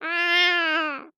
Animal Mammal Carnivore Cat Domestic Cat Meow Angry; animals; AdobeCC